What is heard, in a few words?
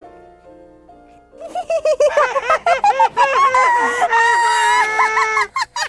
cat makes swampy cry; miss giggles